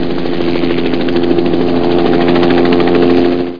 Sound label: Helicopter